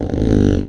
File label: clean; mahlendur